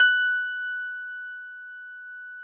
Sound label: F, Harpsichord; Total Piano